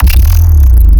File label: Glitchy bass growl